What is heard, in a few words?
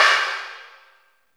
crash L; Zero-G; Chemical Beats; CRASH ZOO